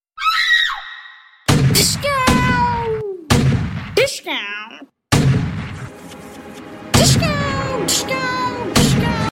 Gun

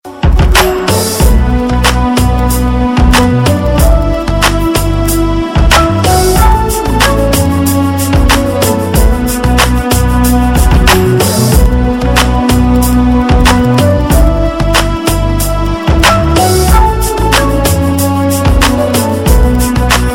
Rock Music

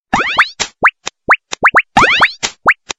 Funny Drop Sms

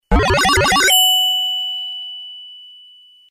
Insert Coin; SF1

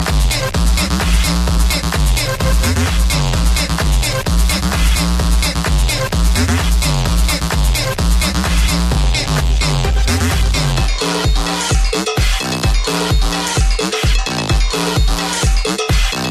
Best Bass

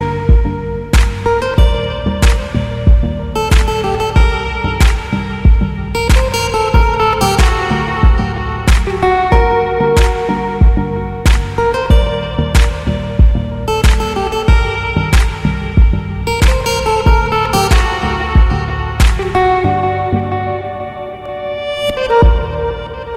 Guitar Ringtone

